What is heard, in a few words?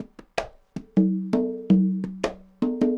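congas bongos 80 bpm, latin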